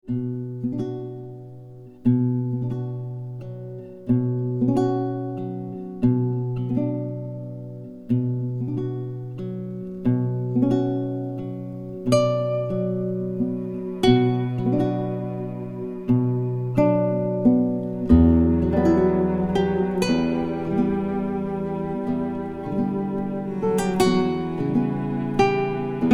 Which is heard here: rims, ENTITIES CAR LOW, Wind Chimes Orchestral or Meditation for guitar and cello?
Meditation for guitar and cello